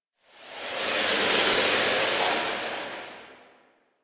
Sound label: Tower Rustling Branch; Warframe; Quests; TheNewWar; Ambience; Cetus; Unum Tower